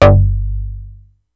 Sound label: Synth oneshot Stab G, Synth Bass; Slate Digital BASSHOLE Sample Pack